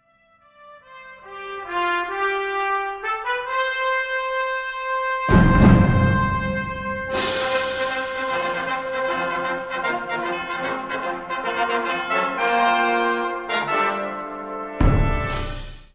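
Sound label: trumpet; trompete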